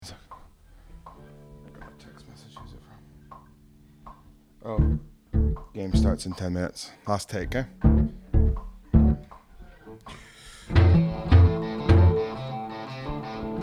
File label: bells